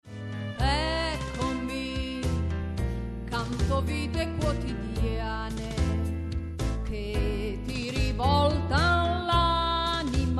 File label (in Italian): canzone sociale voce